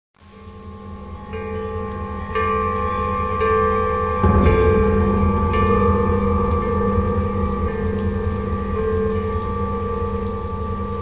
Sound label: Bells